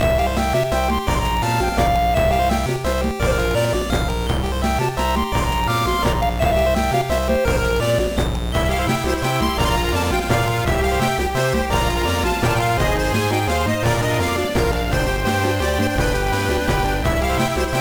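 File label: background music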